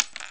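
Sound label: coin bounce; Universo Animado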